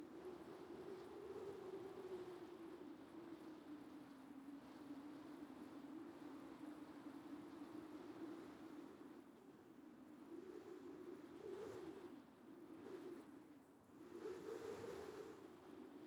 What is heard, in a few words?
ambience arctic calm; Rust